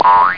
boing; World of Video

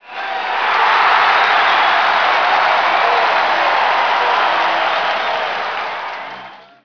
clap, stadium; hockey95

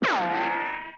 rabbit; The Interactive Alphabet

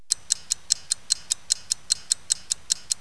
sml tick, house clocks; KABOOM! - Wild and Wacky Sound Software

